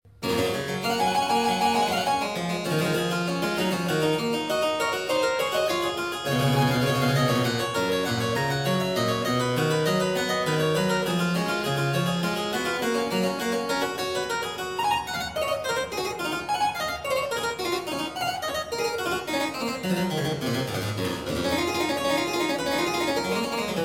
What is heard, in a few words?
Goldberg Variations, Variatio 2 a 1 Clav; J.S.Bach; BWV 988 Variatio 14 a 2 Clav